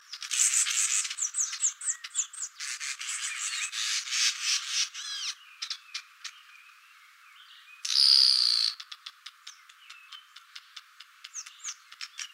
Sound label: Masked Weaver